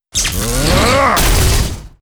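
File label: monster attack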